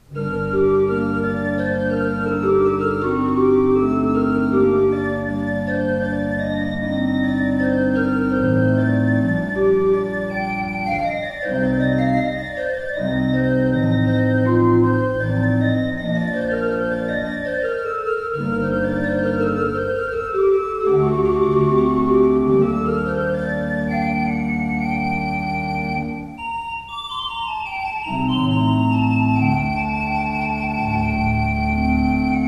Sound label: Ott